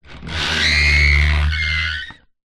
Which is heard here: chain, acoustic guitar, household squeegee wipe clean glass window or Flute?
household squeegee wipe clean glass window